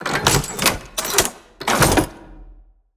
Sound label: pullbar; activators